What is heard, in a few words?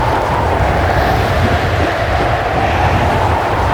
spear shot loop; pantheon